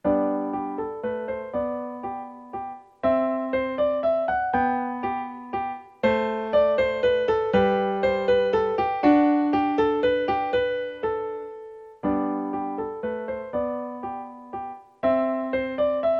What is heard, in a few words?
Minuet In G